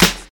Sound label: noisy snare F sharp key, Royality free snare single hit tuned to the F# note. Loudest frequency: 3648Hz